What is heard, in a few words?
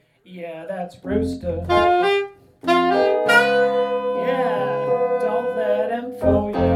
Hornline; Rooster